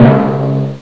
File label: timpani drum sharp roll, percussion instruments; Sound Effects for Multimedia - Volume 1